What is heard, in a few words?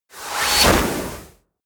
swoosh